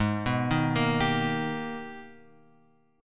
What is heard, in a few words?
Gsharp Minor Major 9th; G#mM9; {4 2 1 3 X 3} arpeggiated